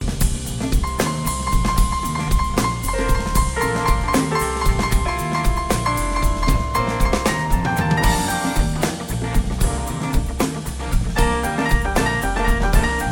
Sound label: War Drums